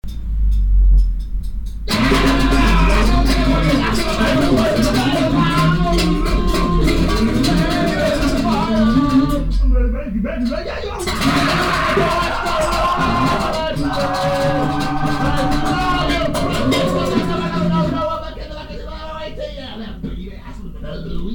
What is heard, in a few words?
Jazz